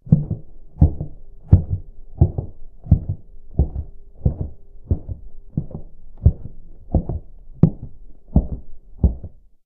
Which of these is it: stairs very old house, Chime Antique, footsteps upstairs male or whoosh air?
footsteps upstairs male